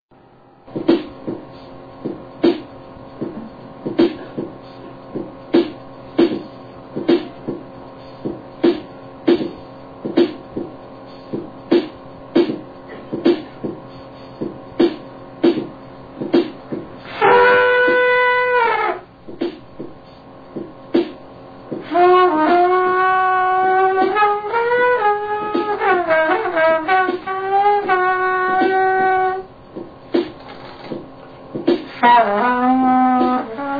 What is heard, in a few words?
trombone